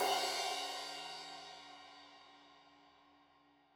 Crash 15in L edge, Cymbals Stereo; Deeply Sampled Drums

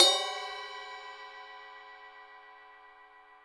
Ping Ride up; Digital Sound Factory

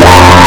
FX GTR, GUITARS KIT; Big Beat Samples & More; AKAI

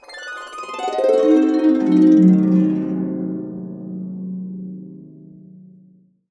C DIM7 CA DN, CASCADE DN, Complete Harps; USB Soundscan vol.62; AKAI

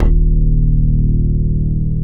PUNCH BASS

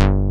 RUB BASS; Pro-Rec - Synthsonic; BASSES

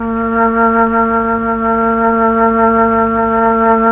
FLT, Melotron Flt, Tron Flutes

